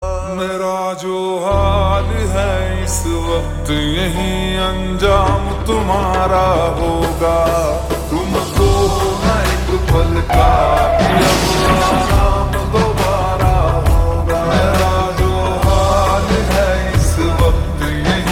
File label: Ringtone Slowed Reverb